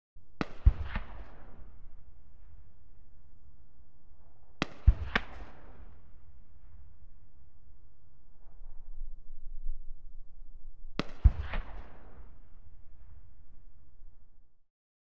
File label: Warfare, Scimitar, 3 Shots Fired; BBC Sound Effects Library; Explosions Guns Alarms